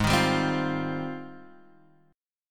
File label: Ab Major 13th Ab Guitar Drop D